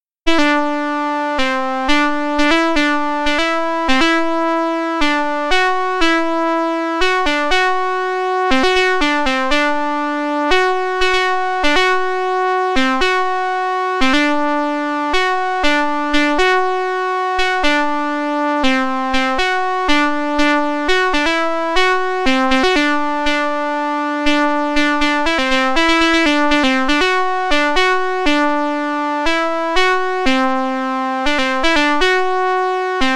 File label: gene seq to music via pd